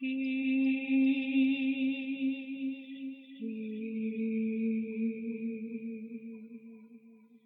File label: mermaid song